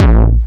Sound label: BD hardcore lomp, DRUMS; Polyend; Legowelt